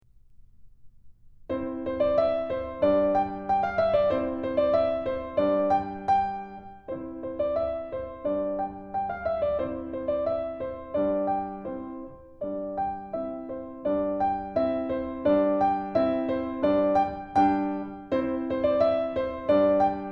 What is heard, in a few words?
Trumpet Tune; Keith Snell Level 00 Etudes